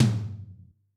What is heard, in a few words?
Room 3, Tom, Yamaha DTXpress-II